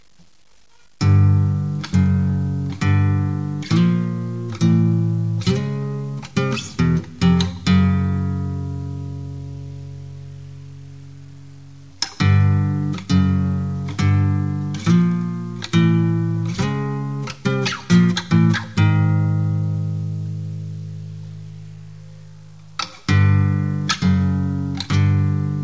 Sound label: suspense